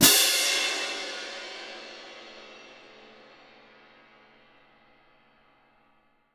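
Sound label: CYM F right, cymbal, percussion; Miroslav Vitous - Percussion & Harp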